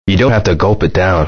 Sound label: dont gulp